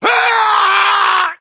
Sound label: scream, Screams; civ13; civ14